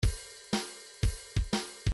shuffle 2 bar

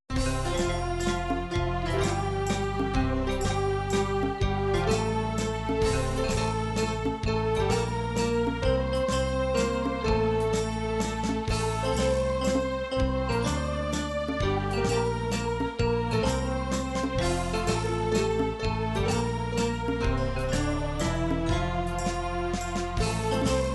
bulgarian mazurka

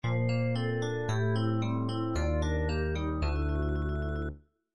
item discovery; nintendo metroid

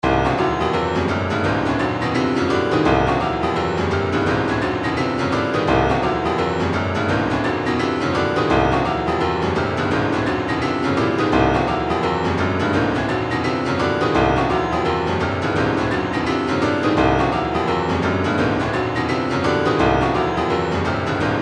retro funk, midi; brooklyn

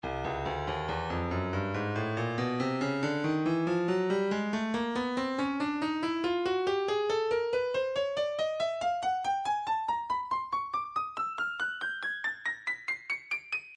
breakbeat midi 070 bpm ping sixteen; Stylus Breakbeat Midi Files

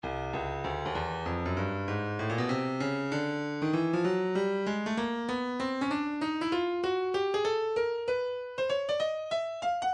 breakbeat midi, 97 bpm; ultrafunk b; Stylus Breakbeat Midi Files

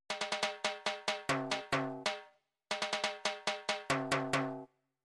individual drums timbales, midi

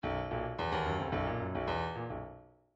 midi file grooves rock

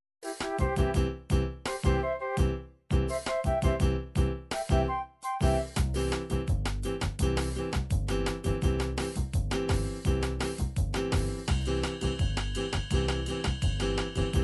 bossa nova, midi

